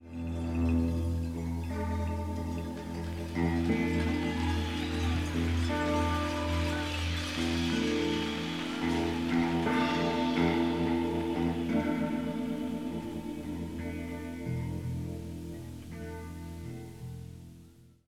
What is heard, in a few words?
leslie